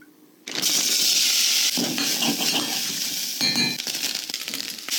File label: Stirring a pot